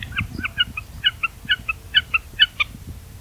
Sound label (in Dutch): watersnip contactroep in vlucht